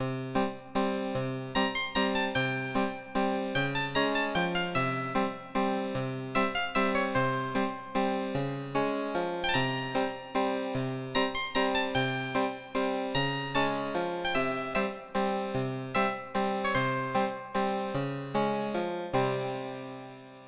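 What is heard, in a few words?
Harpsichord; Ultima VII The black gate and The Serpent Isle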